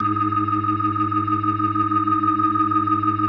B3, First Call Vintage Keyboards

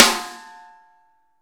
SN AMBIENT, Real Mega Drums; Best Service; Real Mega Drums VOL-1

